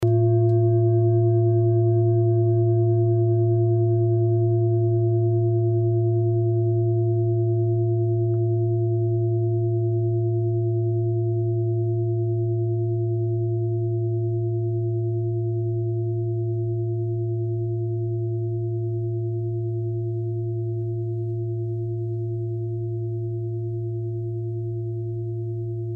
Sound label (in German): klangschale ladakh